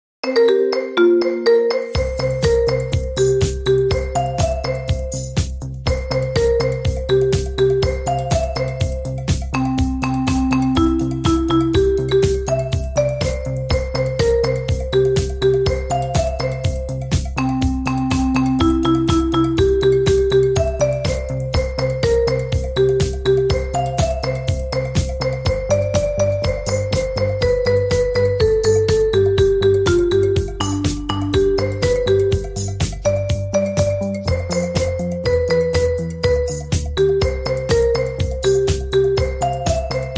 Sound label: marimba